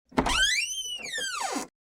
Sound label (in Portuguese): porta abrindo